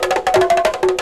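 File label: NTIMBO, AFRICAN RHYTHM; Crypton - DrumBase5000; AFRICNRHYTHM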